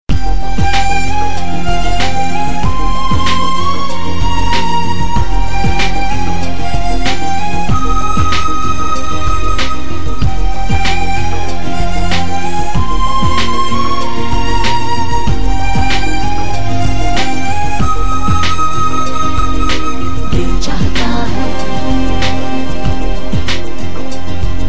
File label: unusual Tone